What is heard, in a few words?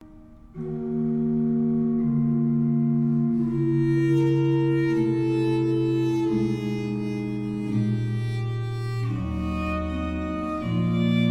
Sonata for violin viola da gamba basso continuo in A Minor Largo